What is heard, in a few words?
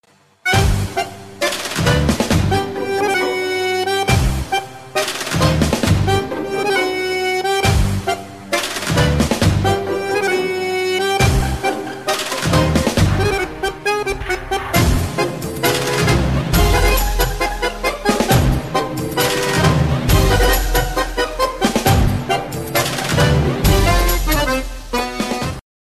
latino tango ringtone